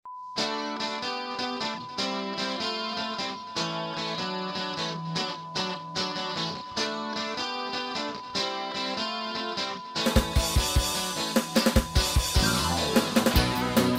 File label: Bassman Sound Works